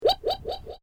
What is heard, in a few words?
Created Creature Small Ping, Alien Sound Effects; Created creatures, vocalisations and pretend speech